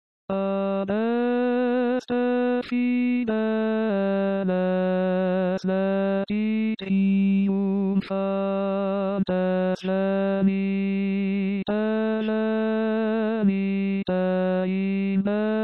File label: Tenor; adeste fideles